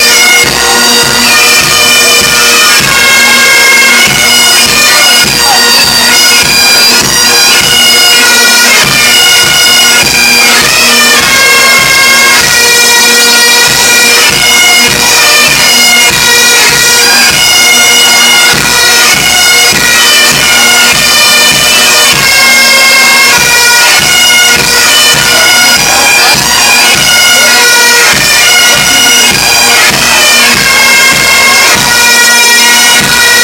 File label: highlanders bagpipes; olympia